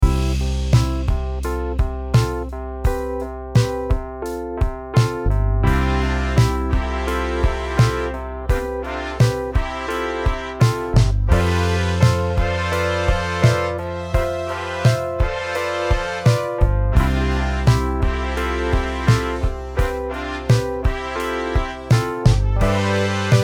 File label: song